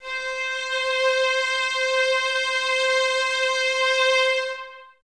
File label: violas C 5, String Ensembles, Exprsive; Miroslav Vitous